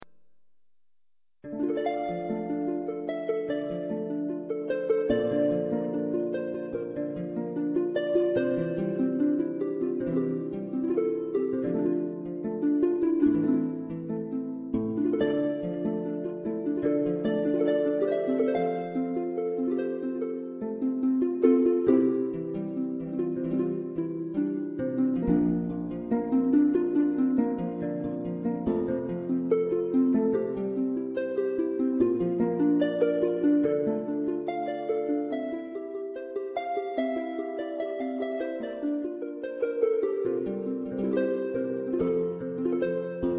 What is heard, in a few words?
harp